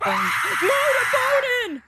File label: motorboating